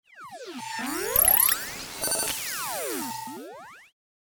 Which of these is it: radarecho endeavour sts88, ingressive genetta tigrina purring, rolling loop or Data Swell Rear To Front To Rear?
Data Swell Rear To Front To Rear